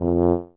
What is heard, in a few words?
Tuba tone